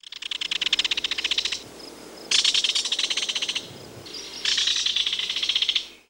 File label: Belded Kingfisher